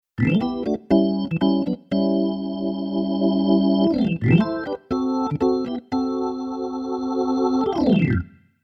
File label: Keyboard Organ Funk Organ